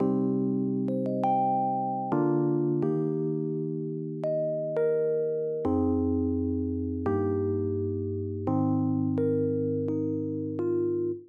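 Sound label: Gospel D minor 85bpm keys; Talk To Me Gospel 3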